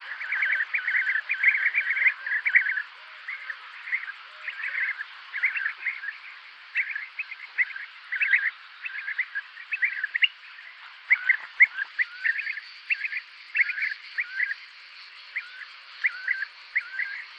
call, bee-eater, There are some adult calls mixed with chick vocalizations; Bee-eaters are already fledged but still fed by parents.; merops apiaster